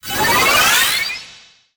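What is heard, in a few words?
Summon Synchro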